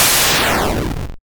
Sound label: wave end; Mindustry